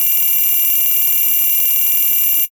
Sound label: Vint. Phone; Roland BK-5